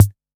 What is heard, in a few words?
Analog MT40 Kick